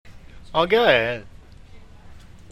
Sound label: ahh